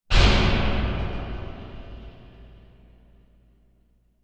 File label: sound design big horror hit metallic cinematic